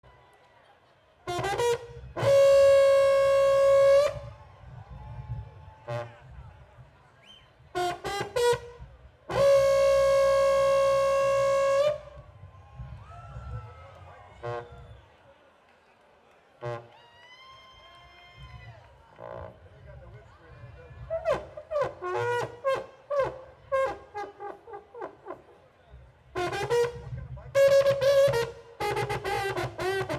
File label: Trombone Solo